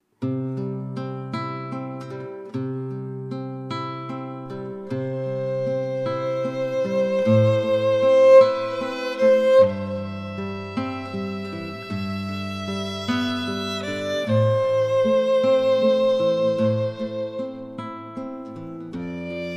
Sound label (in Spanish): canción ave maria interpretada por guitarra y violin